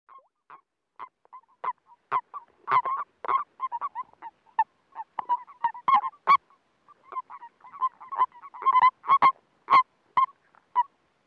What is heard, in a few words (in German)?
Erdkröten beim Ablaichen Unterwasseraufnahme